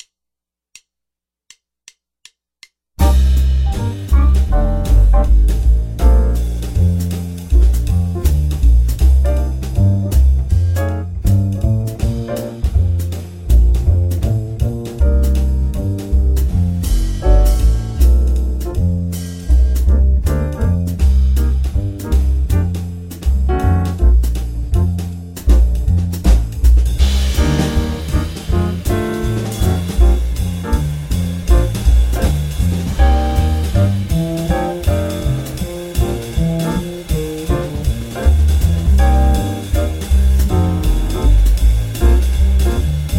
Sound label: Cm7-F7-Bbmaj7 160